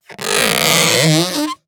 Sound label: Cartoon Balloon Rub By Hands Squeal; AdobeCC